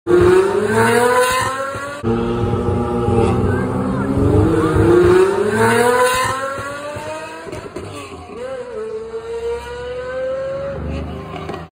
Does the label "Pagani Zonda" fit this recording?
Yes